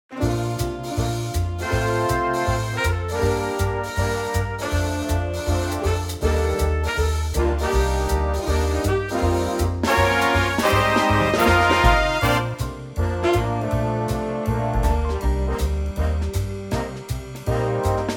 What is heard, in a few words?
Bb Big Band; vocal range - D to F; mid tempo swing or a jive tempo